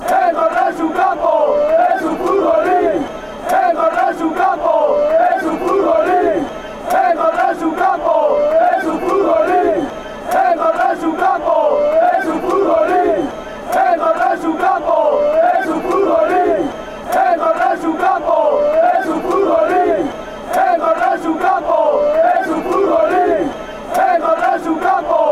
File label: pitch, fanchants